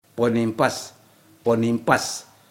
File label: sweep with IF